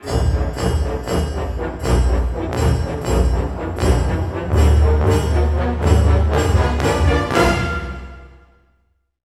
Orchestral Loops; 128 STRAVNSKY

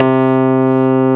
SUIT AMP C2, Keyboards Old School; USB Soundscan vol.09; AKAI

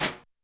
Brush Tap L; YAMAHA RM1X

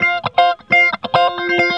GUITARS 135, House

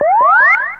FX; AMG Megabass REMIX! VOL-2

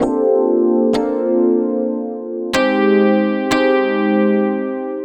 EP, Keyboards, Electric Pianos

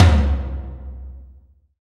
TOM TOM, Roland

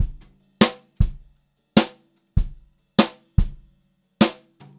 JZ VARI, Brushed Drum Loops, JAZZY

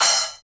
Splash Cym Stop Long; Roland BK-5

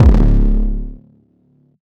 808 crunch c

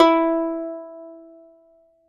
Plucked Lyre E4; Polyend; Simulacrum Sounds; 1 Shots

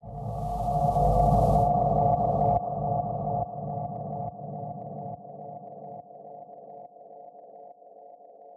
fx spoopy

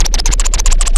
Scratch Up; Mo' Phatt; Digital Sound Factory Mo' Phatt

